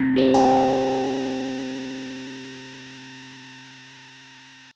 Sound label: Synth, Gasious Giantz Snuffel